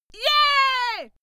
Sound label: female cheer yeah